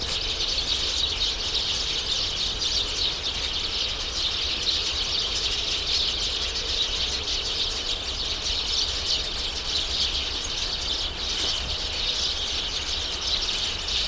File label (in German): feldsperlinge